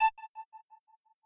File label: synth